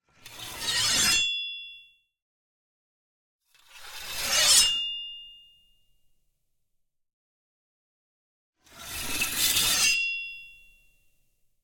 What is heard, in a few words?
designed sword unsheathe; hero